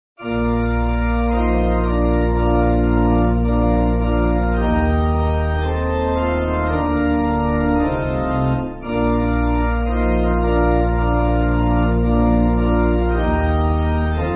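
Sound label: Pipe Organ, Backing Files for Churches; The Dying Day; Nilsen Lund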